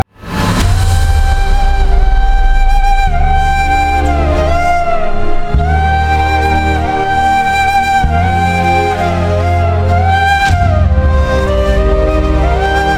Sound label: Indian BGM; Indian 2; Go Back Indian